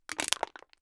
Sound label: tin can smash